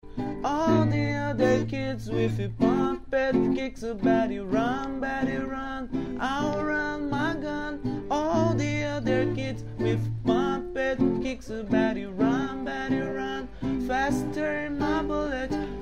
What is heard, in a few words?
kicks